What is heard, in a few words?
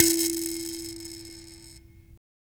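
RATTLE KALIMBA E 4; Heart of Africa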